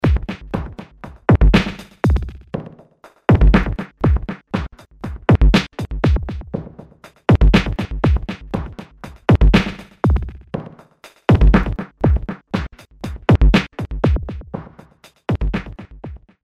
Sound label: Beat